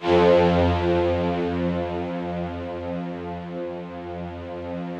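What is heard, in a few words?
Yamaha VP-1, rough strings F1; Music Store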